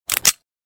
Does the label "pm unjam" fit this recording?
Yes